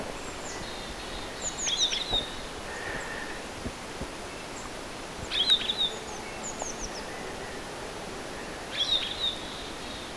taviokuurnan aania

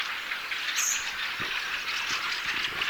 voisi olla siperianrastas

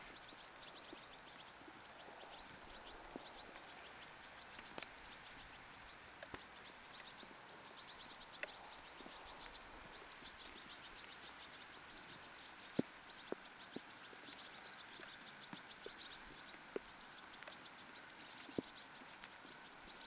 tiklipesue pesueen kerjaysaantelya

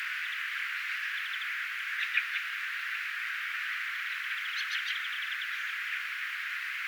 viherpeippolintu saaressa

